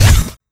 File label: Sound FX Scifi Drum Kick